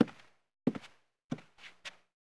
koberec; Mafie 1 cz

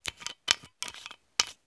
lahev; Mafie 1

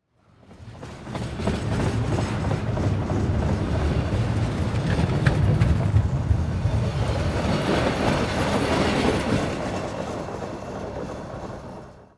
vagonky; Mafie 1

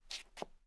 soupnuti; Mafie 1

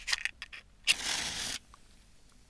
sirky; Mafie 1

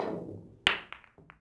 kulec; Mafie 1

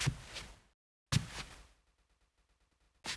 preslap koberec; Mafie 1

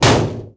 plech; Mafie 1

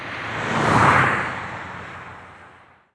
auto prujezd; Mafie 1

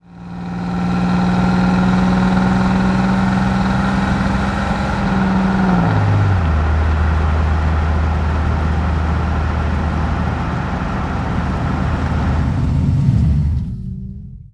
auto; Mafie 1

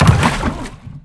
doskok clun; Mafie 1

